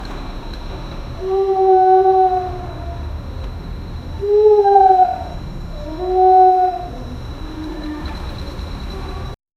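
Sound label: a childrens daycare at night, banging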